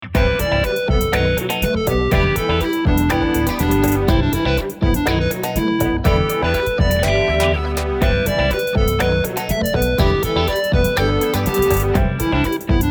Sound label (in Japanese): 全てのトラック; サビのアレンジ全体; Logic Pro 11